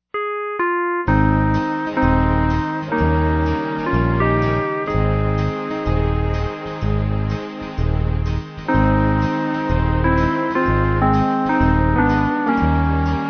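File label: keyboard; Just A Closer Walk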